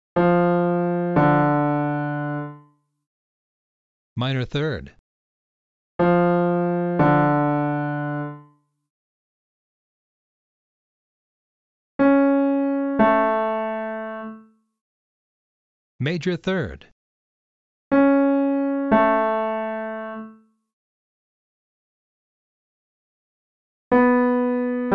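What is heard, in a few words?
minor 3rd major 3rd descending; Interval Recognition 1; Training Exercise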